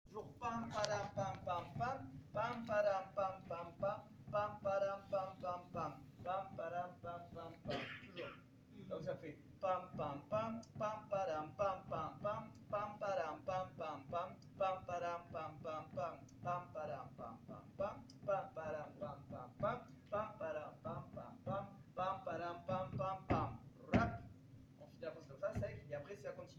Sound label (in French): Mazurka lent